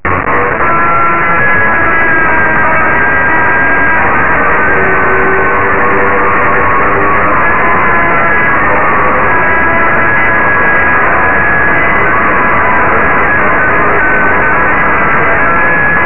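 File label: guitar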